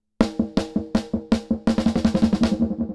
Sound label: Delay CUTOFF MODULATION RATE MOD 100.0